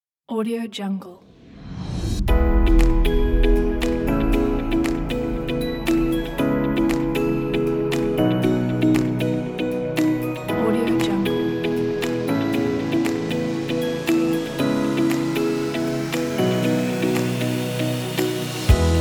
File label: bells corporate advertising; sunproductions